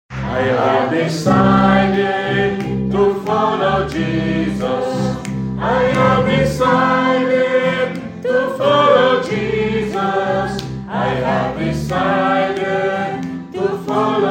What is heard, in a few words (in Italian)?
soprani; I have decided to follow Jesus; Voci; studio canti